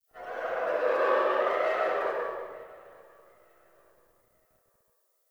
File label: Tree Distant Critters Angry